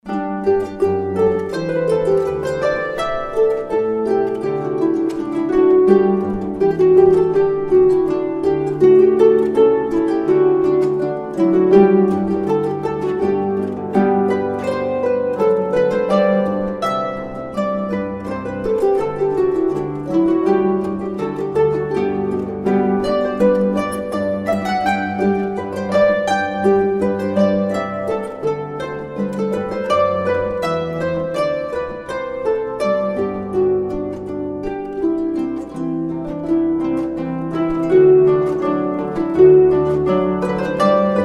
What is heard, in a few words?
harpists